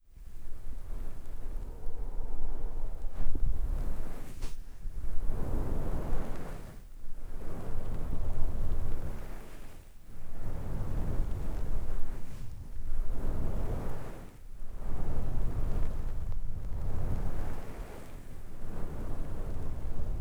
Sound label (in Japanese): やわらかタオルマッサージ